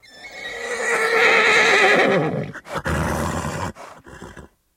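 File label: sound hoarse neighing and exhaling of horse; loshadi i konya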